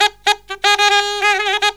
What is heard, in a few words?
SAX, Killer Brass Riffs